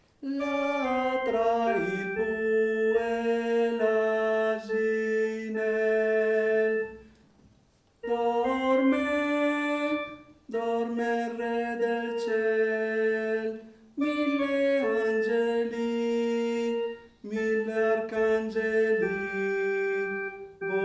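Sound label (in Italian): Tra il Bue E L'asinello, tenori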